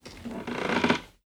walk wood creaky

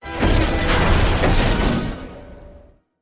Gunship Explode; Gunship Cavalry; LEGO Star Wars

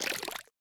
Spill Liquid; boomerang fu

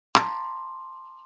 pinball loose

